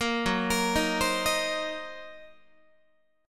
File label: F sharp 6th Flat 5th F sharp down Guitar; F#6b5; arpeggiated